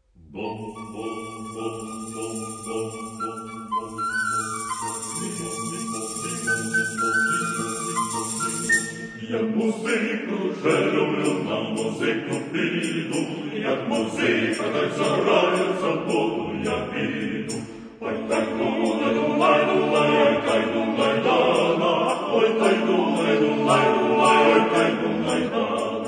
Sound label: Kolomiyky, ukrainian folk melodies